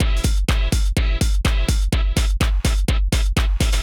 DRUMLOOP HOUSE 125; eJay

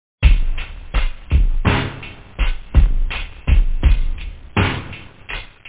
chaser, loop

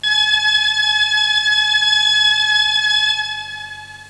string, cartoon sfx

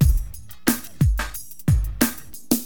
DM LOOP, HipHop, Drums; LiveAct Pool

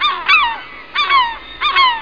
seagulls

